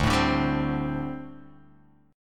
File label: D 7th Suspended 4th, down